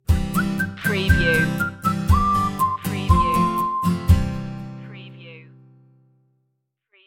whistle happy stinger

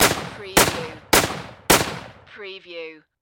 Rifle M1 Garand

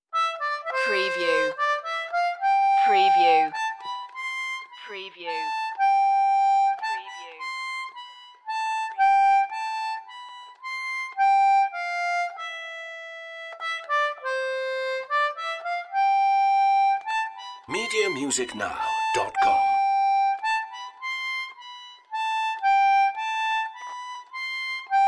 first nowell solo concertina

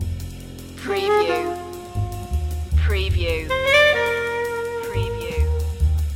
loop

